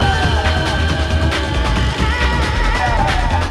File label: zenodrum